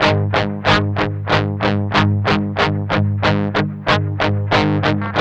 med rock 8th, guitars

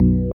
organ, keys

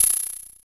FX Analog PER; Sound & Vision - Gigapack II

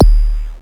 BD, Mutable Instruments Anushri

